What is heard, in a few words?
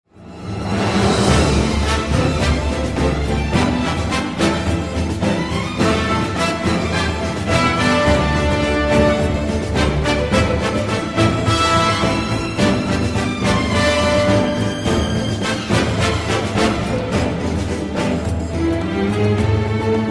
Drums; Drums of Liberation; 着信音